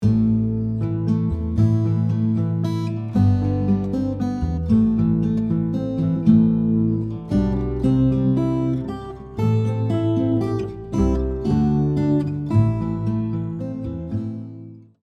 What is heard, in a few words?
Scarborough Fair arrangement, guitar; Scarborough Fair is a traditional English song